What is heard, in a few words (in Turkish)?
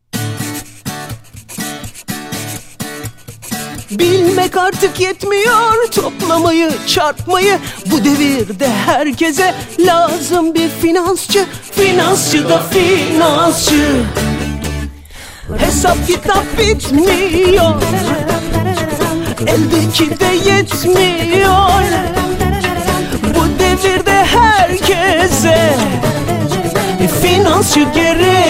reklam muzigi; finansbank